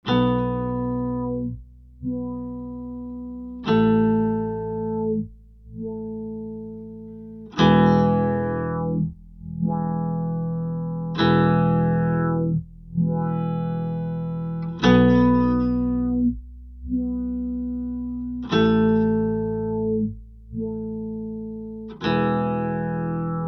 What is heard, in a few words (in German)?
EHX Pico POG LPF Range Sub und Octave Up